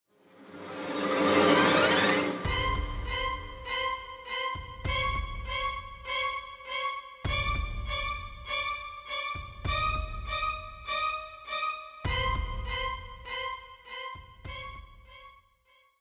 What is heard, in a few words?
BGM Horror; PAPER MARIO Color Splash; wiiu